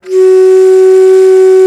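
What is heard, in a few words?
bass bansuri, World Winds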